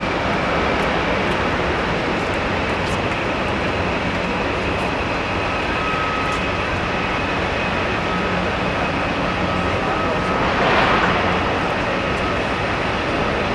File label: ambience construction site large ground zero nyc; sound effects one